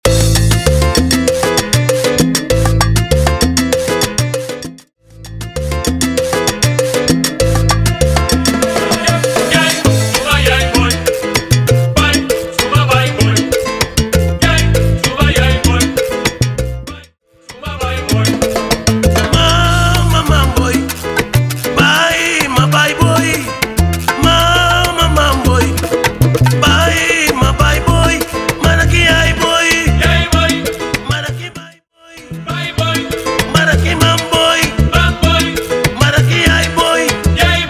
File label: Intro Steady 98 BPM